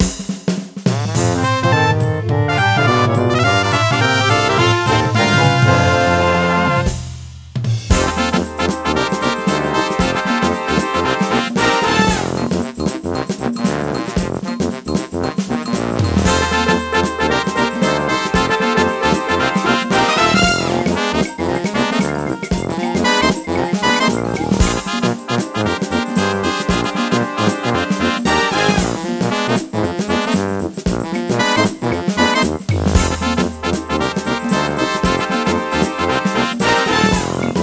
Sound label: music jingle level clear; Donkey Kong Country Returns HD